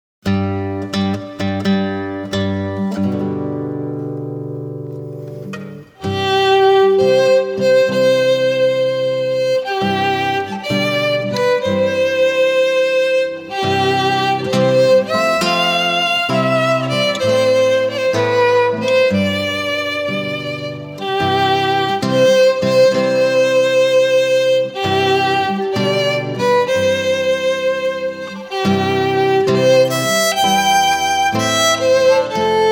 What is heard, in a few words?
duet wedding march, guitar